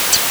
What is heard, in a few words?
pond